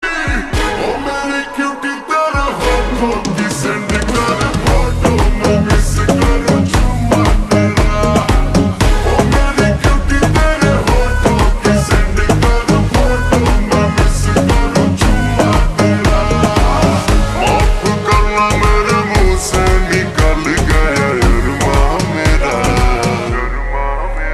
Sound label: Ringtone, slowed and reverb